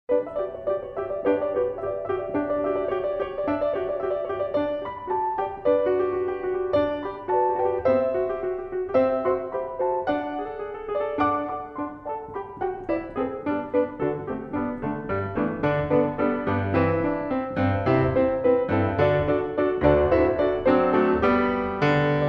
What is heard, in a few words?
Jingle Bells